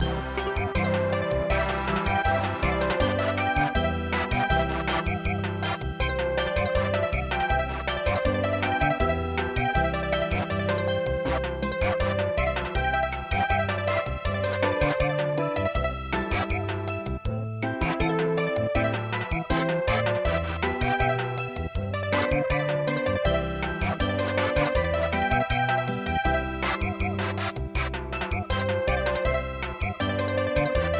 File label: Samba, midi traditional; Weeding Song Nanyatoraya